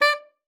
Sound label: D5, ALTOSAX; Xsample VOL-2